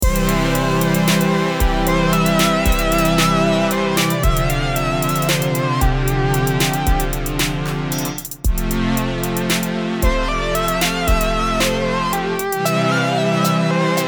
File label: Retro Machines